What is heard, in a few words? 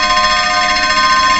alarm